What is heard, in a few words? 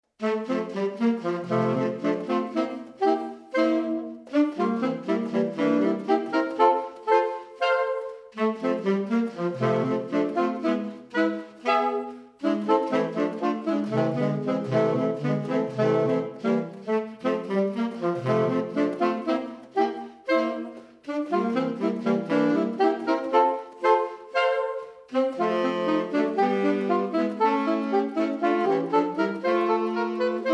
Pizzicato Polka